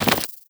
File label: walk, antlion